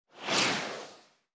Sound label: nosedive swoosh